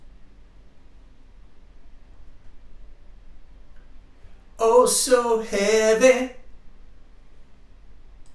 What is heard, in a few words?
vocals